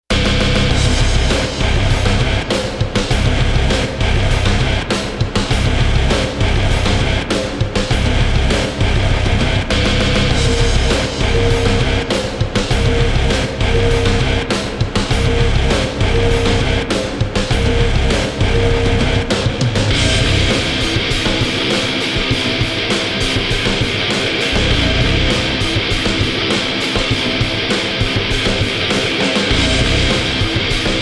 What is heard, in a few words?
Rock